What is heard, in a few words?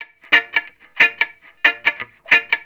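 reggae electric guitar #A; Best Service Advanced Media Trax Modern Composer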